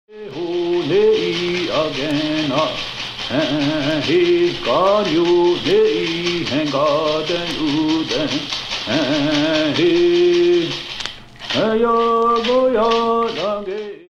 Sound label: Healing Music Iroquois